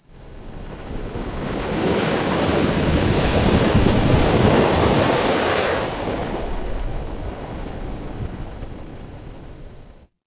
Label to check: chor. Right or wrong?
Wrong